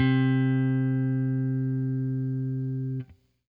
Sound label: FENDER SFT, Guitar; Quantum Leap